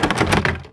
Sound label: locked; blizzard